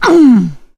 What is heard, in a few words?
melee swing; source engine; left 4 dead 2; producer